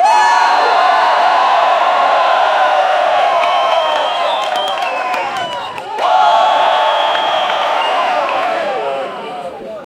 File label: huge crowd shouting, chanting